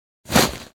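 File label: inventory bag; STALKER; IWP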